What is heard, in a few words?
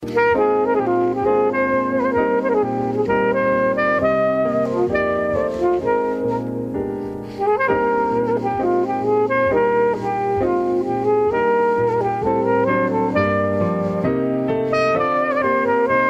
jazz